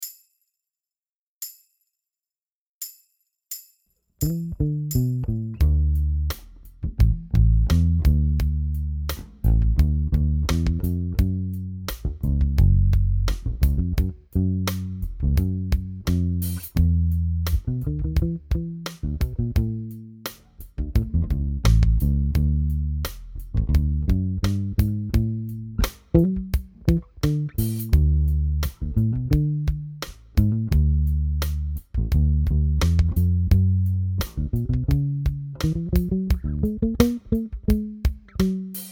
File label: R&B, bass